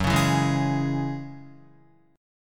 Gb 7th Suspended 2nd Gb down Guitar; strummed

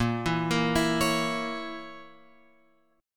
A sharp Diminished, chord; A#dim Chord {6 7 8 9 X 9} arpeggiated

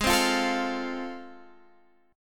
F Major Ab, guitar chord; strummed; F/Ab

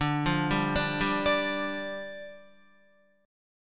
D Minor Double Flat 5th, down, Guitar; Dmbb5; arpeggiated; JGuitar

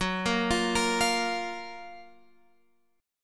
Gb Major 7th, down, Guitar, Open F; GbM7 arpeggiated

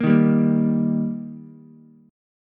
G Minor Sharp 5th G Ukulele, chord; strummed; JGuitar

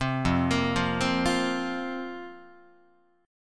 F Suspended 4th Guitar, chord; Fsus4 arpeggiated; JGuitar

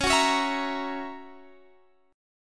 F sharp 7th, guitar

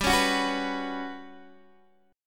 G Major 7th Flat 5th, Guitar, Open D

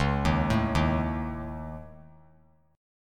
Db Suspended 2nd Sharp 5th; arpeggiated; Dbsus2#5; JGuitar

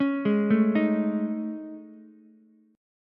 A Major 7th Flat 5th, Ukulele; arpeggiated

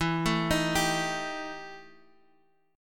E Minor Major 7th Double Flat 5th E down

